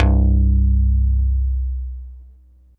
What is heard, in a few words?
T.BASS2 C1, TAKK-BASS, Yamaha VP-1; Music Store